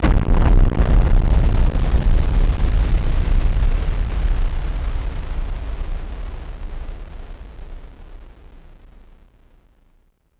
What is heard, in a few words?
Drum Bomb; musicradar; bomb factory; Drum Bombs